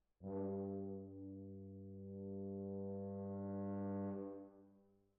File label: Hall, Jazz for piano